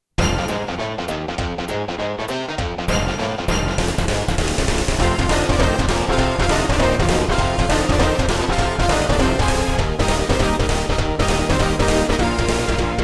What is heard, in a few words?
Alleycat Blues; TMNT 4